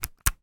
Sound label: technology camera digital memory card release from slot